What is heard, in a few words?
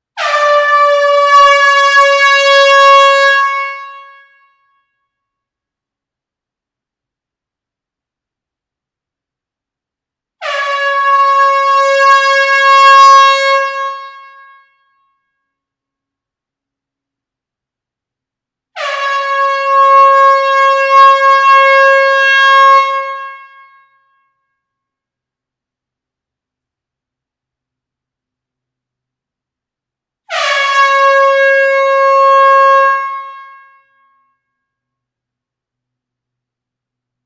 air horn in close hall series